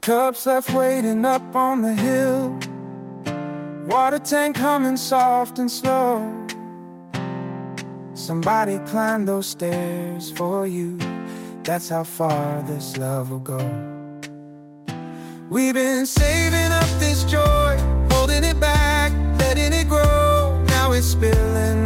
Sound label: birthday, name songs; addison